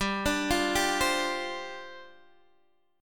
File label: G 7th Suspended 4th G down Guitar DADGAD